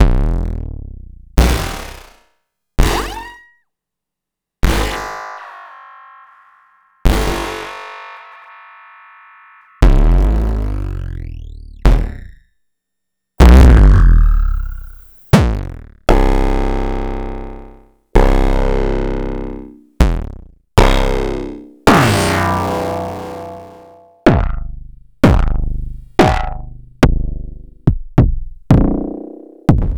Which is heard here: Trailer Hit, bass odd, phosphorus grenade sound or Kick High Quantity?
Kick High Quantity